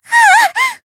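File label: Voice damaged, Japanese; sincere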